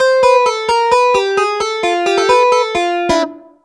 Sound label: 2 STEP 131 SYN, House Side Of 2 Step